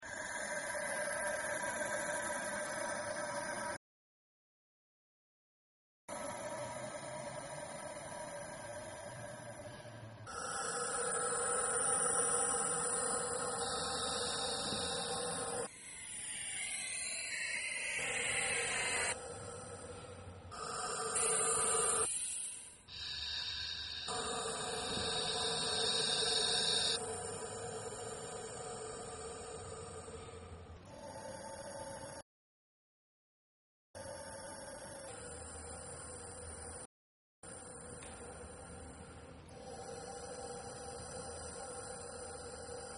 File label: jet whistels